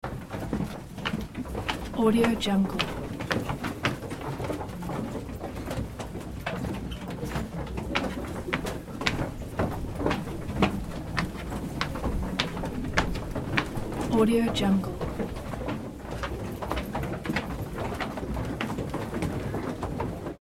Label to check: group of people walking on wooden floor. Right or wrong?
Right